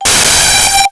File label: ding